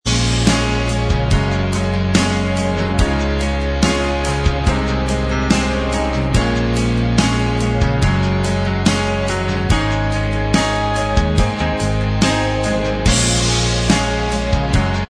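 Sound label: instrumental, backing tracks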